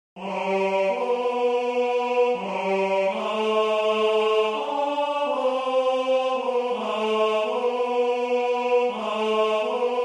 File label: tenor